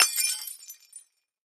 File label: Champagne Glass Cras, kitchenware